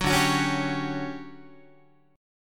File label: D Major 11th D down Guitar 4A 4E 5A 5D 5G sharp 6C sharp; strummed; JGuitar